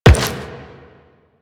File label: Step Metal, Footsteps; Warframe; Quests; The New War; Kahl